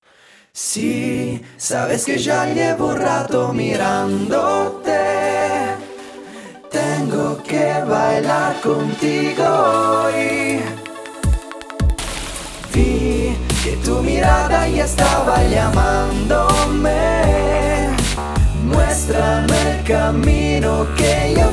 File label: DubStep bez kompresora